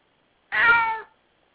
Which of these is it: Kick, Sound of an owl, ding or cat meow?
cat meow